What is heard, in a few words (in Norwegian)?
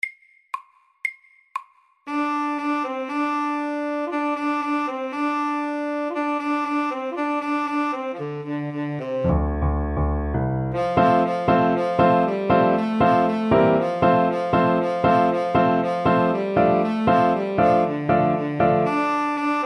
Pentatonisk melodi middels